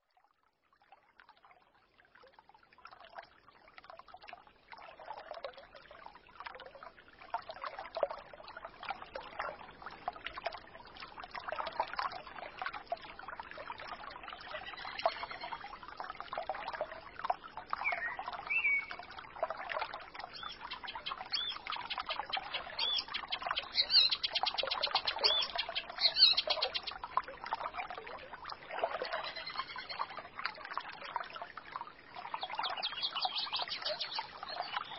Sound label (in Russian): zvuki prirody vesennii ruchei; vesennii sbornik